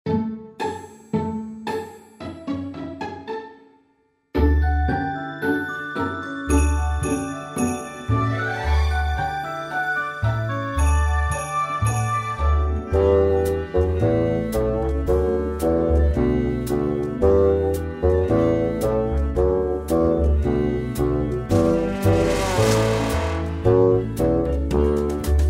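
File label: instrumental version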